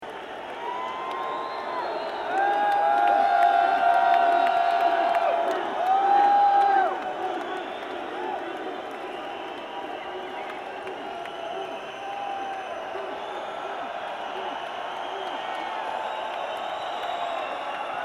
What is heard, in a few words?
Crowd; First Midwest Bank Ampitheater Chicago August 19 2008; live